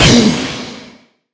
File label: hurt, wither; minecraft; mob